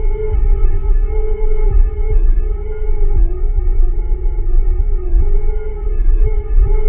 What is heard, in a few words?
citadel drone loop; hl2